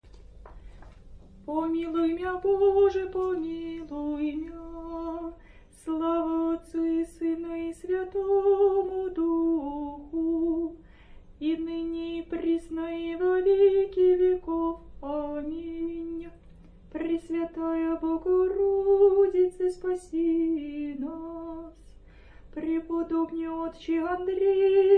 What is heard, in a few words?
great canon, singing; pripevy